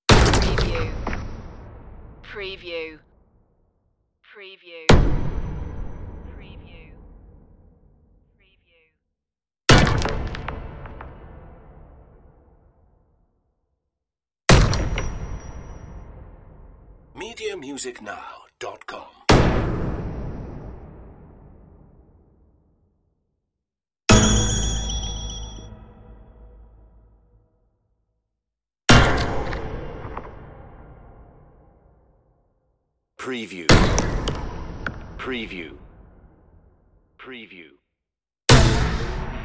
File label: weird impacts